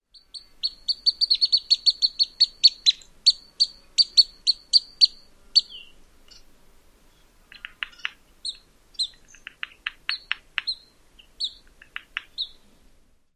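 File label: PYGNUT calls num 2 with tapping, pygmy nuthatch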